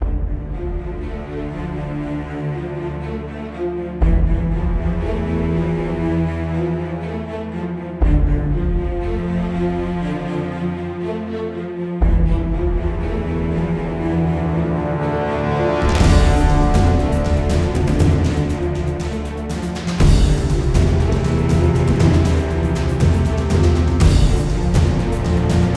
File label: Epic 120BPM; BlueFX